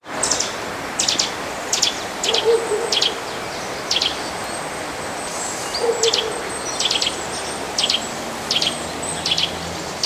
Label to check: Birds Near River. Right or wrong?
Right